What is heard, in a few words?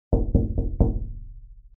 window knock